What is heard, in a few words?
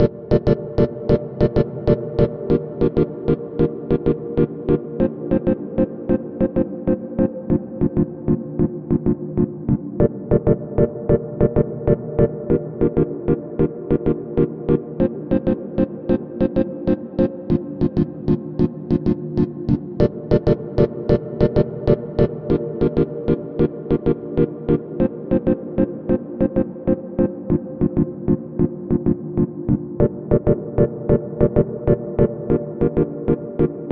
chords